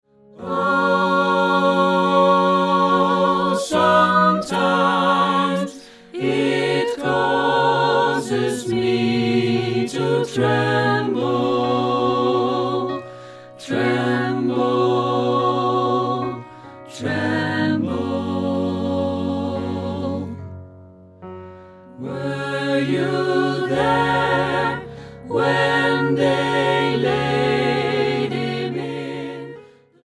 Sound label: Gospel Choir; Were You There